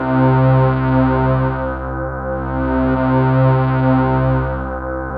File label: SYN MORP, Roland; Sound & Vision - Gigapack II